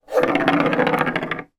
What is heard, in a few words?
foley battery aa roll table; zapsplat